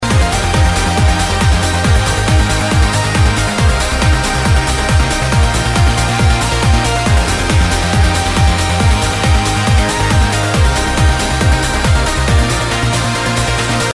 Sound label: The Flute